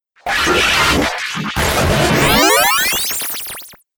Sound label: Radio Stinger